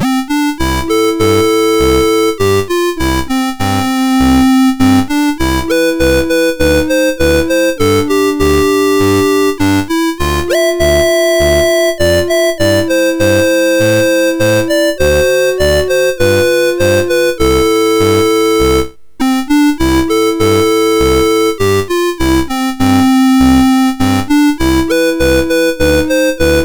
MOS6581R4

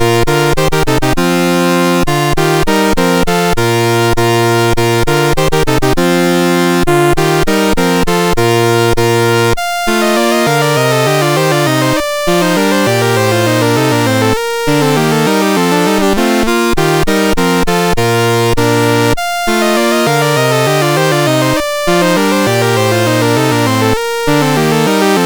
Ding Dong Merrily on High, MOS6581R2

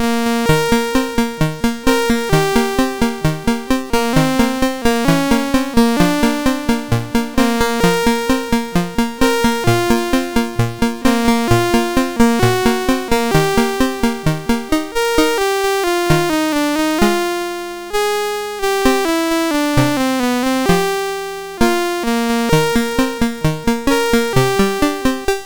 MOS6581R2, sid; Pacos Pete

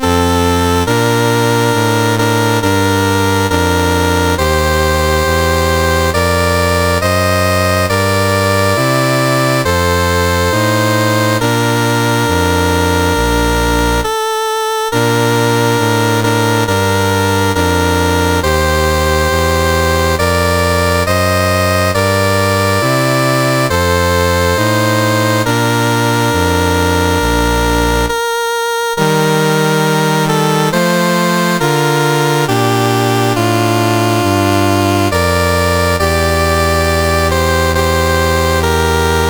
CSG8580R5, National Anthems; Kedah

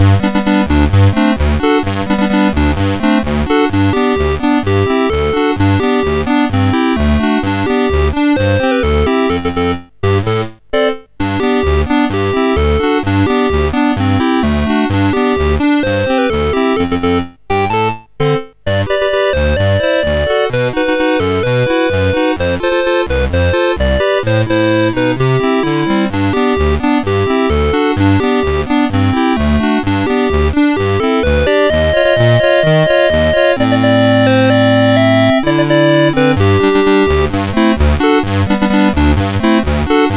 CSG8580; CSG8580R5

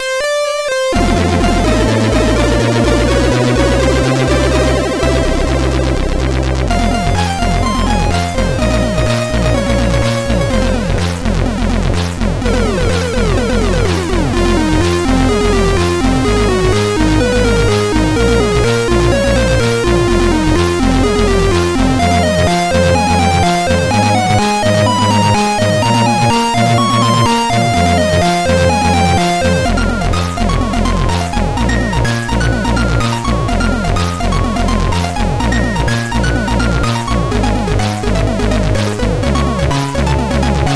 sid, CSG8580R5; Castle Boy

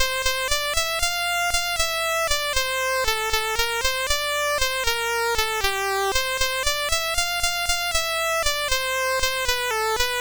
mus CSG8580R5; Solo Sergeant Pirates and Police; Pirates of Penzance

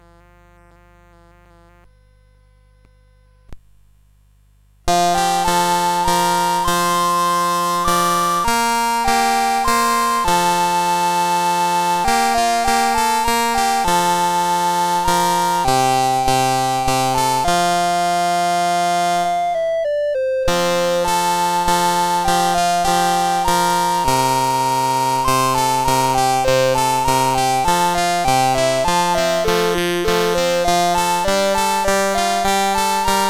Classical Mix, CSG8580R5

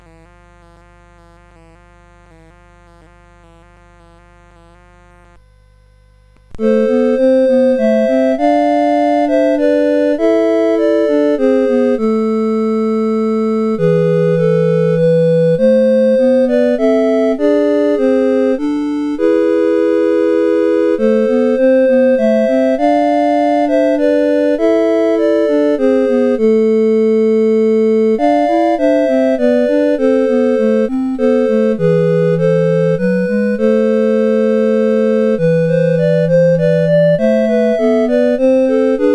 Lullabye, CSG8580R5

